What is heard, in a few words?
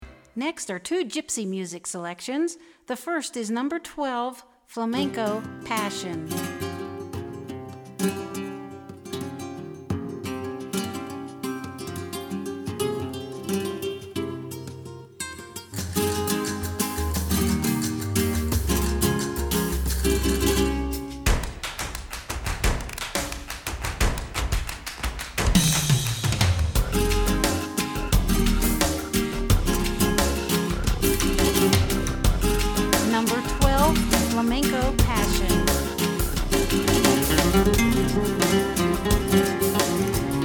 Flamenco Passion